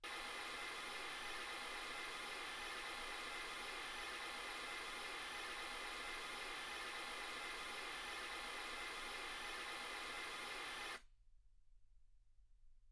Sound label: mls16 48kHz